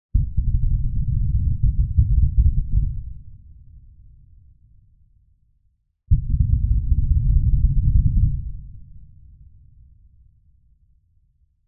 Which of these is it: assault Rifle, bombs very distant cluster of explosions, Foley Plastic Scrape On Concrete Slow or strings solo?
bombs very distant cluster of explosions